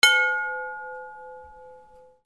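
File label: percussion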